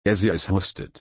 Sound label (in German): husten